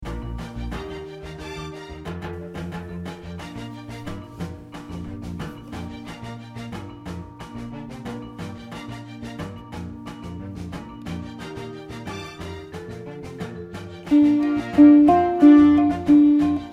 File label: ukulele